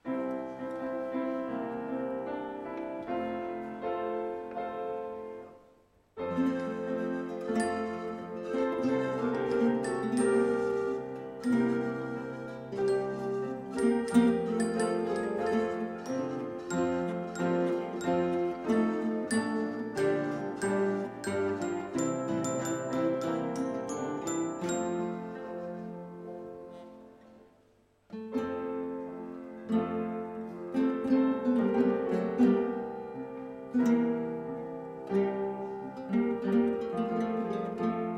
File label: Instrumental